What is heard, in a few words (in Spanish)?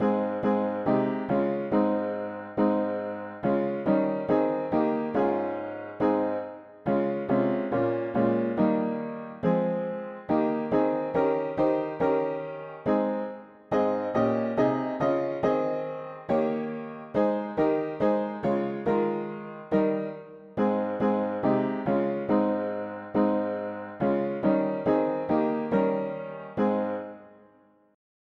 mono piano; hymns; Himnario pequeno